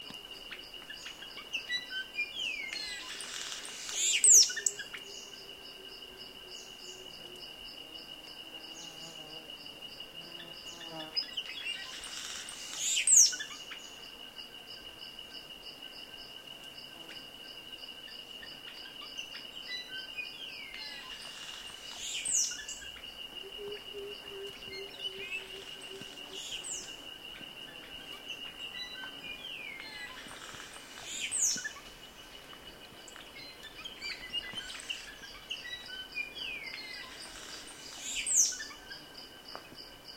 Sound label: Red headed malimbe